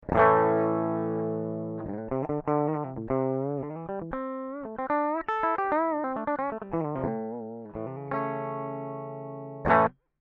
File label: gtr D.I.